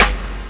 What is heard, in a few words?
SD, Dance Drums